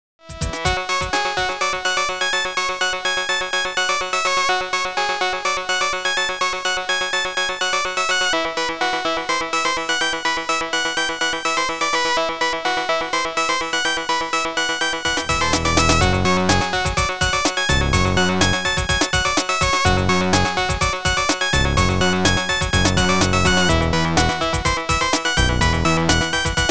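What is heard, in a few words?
keygen song.mod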